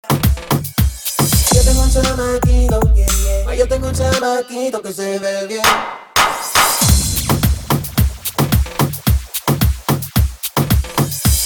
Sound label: Reggaeton Intro Bpm 110